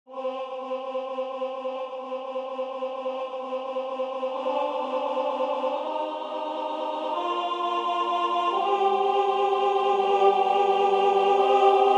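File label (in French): alto, Musique sacrée; Alleluja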